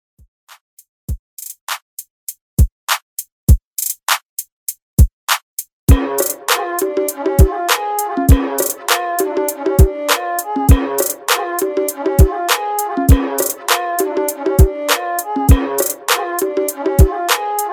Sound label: Hiphop Redrum